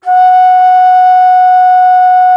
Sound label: T. BONSURI, India Winds